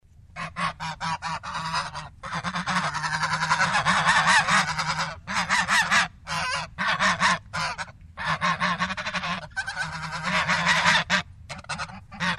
gogotanie treh gusey

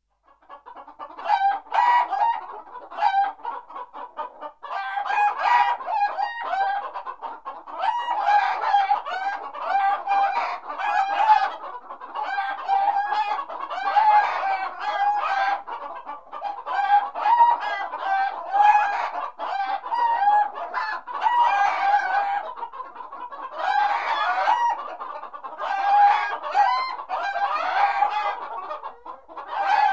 kuri sneslis

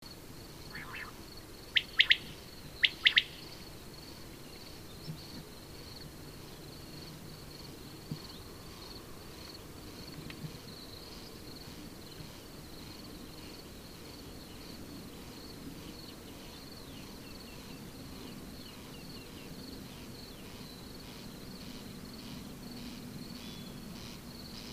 perepel obyknovenny

